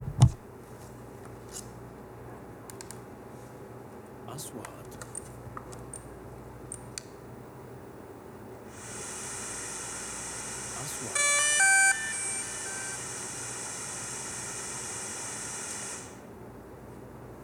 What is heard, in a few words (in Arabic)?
صوت تشغيل درون مافيك; sound effect; shooting